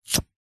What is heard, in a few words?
foley cigarette lighter plastic flint light; zapsplat